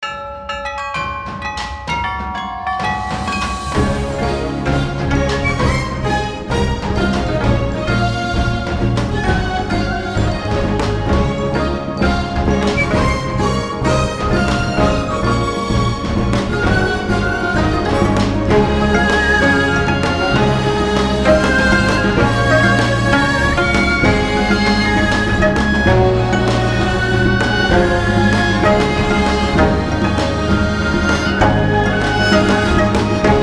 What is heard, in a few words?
Ringtone, Samsung